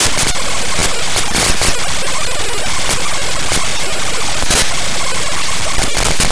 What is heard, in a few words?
Rhythm